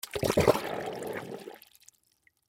Submerge Object, Water Submerge Object Gurgle Fill; Nature; Blastwave